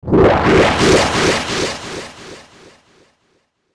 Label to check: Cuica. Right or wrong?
Wrong